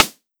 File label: drum hit whistle